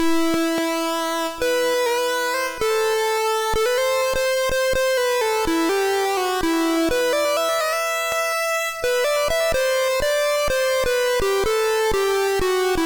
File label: 3SID Tracker, MOS6581R2